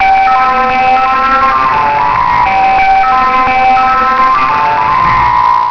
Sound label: ice cream van, Park